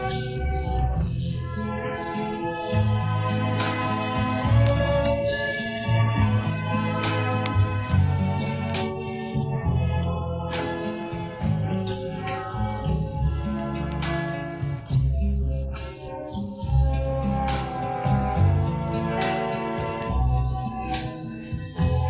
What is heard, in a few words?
accompaniment